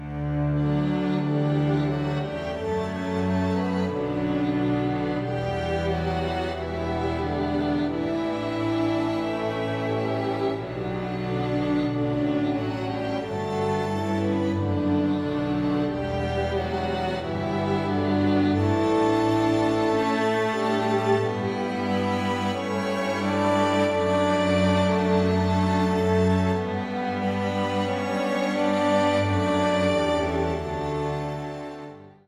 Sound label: Brass and Strings